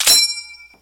M1 Garand Ping

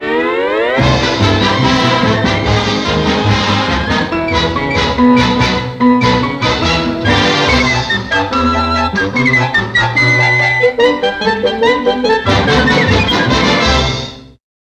Cartoon Theme

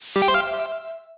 Space Signal

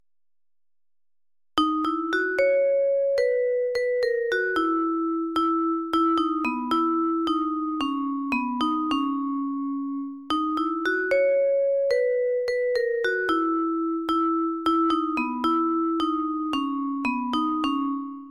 Vibraphone

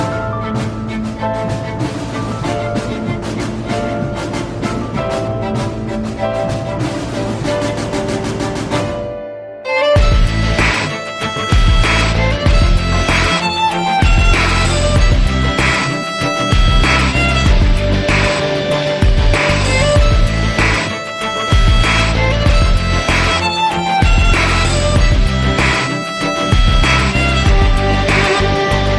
Gangsta Instru Mix

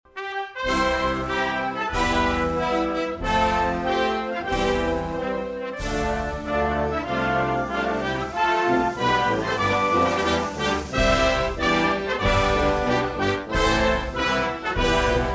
Russian Anthem

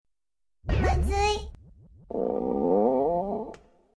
Rumble

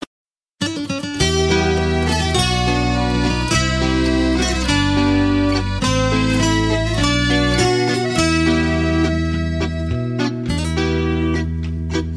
Melody

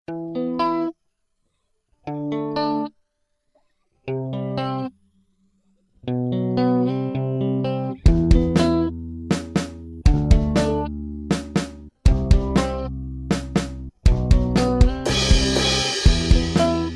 Guitar Vibration

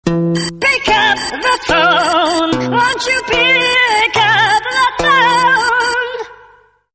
Opera Cellphone

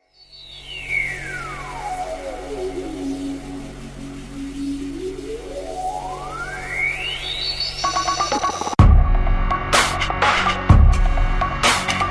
Alien Invaders

